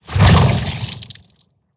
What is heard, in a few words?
spitter spit start behemoth, creatures